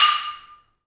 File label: balambo, balafon; Heart of Africa